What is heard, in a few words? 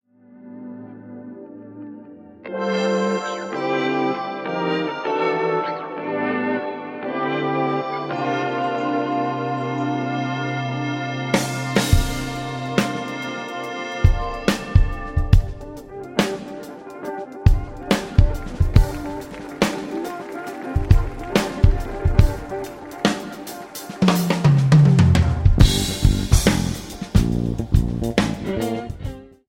Junk Funk sample